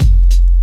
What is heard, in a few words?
KIT, Dance Mega Drums, HIP; Best Service